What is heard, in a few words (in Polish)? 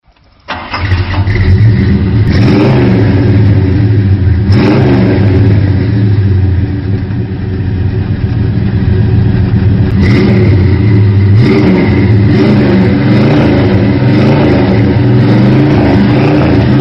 Buick silnik